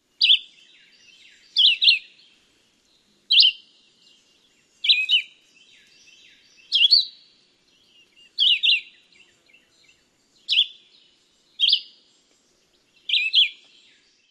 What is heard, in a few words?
song; TX